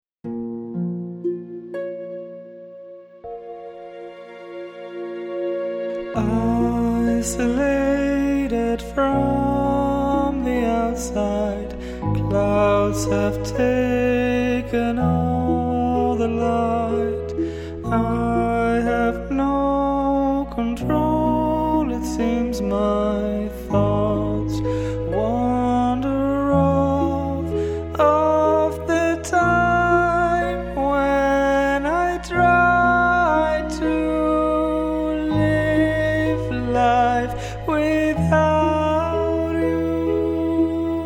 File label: birds mezzo